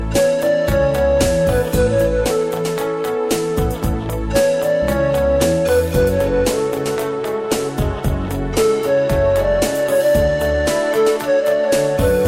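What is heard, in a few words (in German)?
Panflöte, Klingelton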